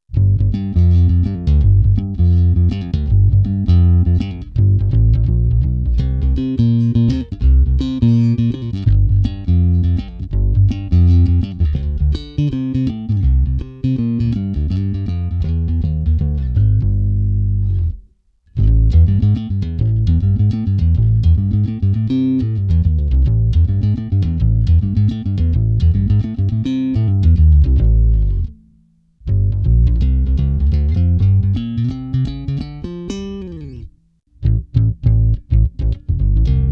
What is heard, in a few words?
swr, amps; zoom b3